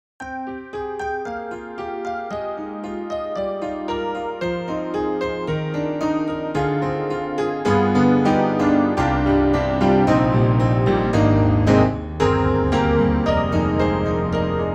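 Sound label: YAMAHA MOTIF-RACK XS Ballad Key, piano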